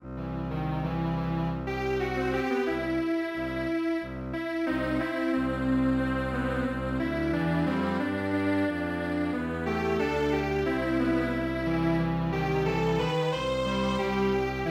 Strings Quartet

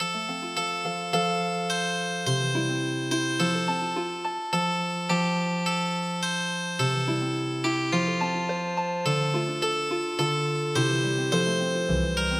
Healing Harp; Angels Heard on High

